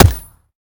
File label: fire supp, glock; weapons; Urban-Coalition; Decay; arccw ud